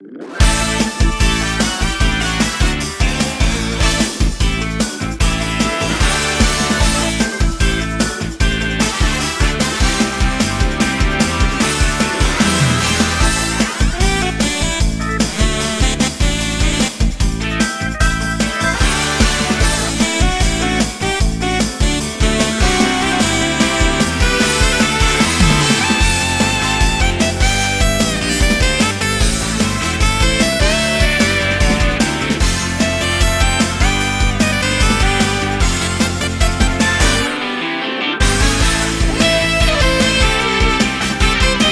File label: B Menu Event